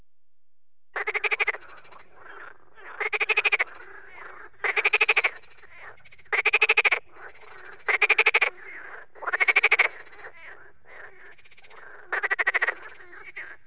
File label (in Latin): rana ridibunda